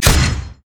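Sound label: armadillo basic attack; rammus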